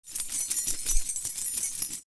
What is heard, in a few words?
Bunch of keys handling and jingling